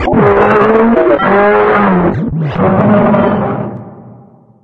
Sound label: dog on dropship, npc; Source Engine; video game